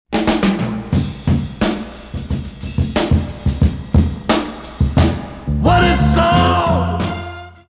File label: Beat